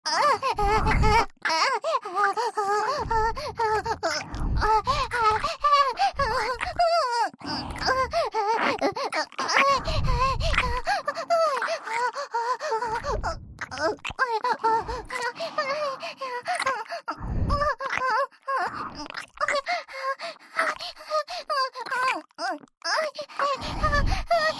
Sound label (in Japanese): 本番喘ぎ + 両耳キス; ソフィ